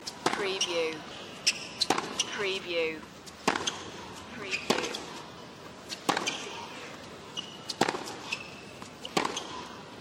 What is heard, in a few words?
Tennis Playing; Sports & Games / Tennis & Table Tennis